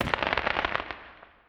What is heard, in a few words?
fireworks